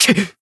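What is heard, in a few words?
damaged, Japanese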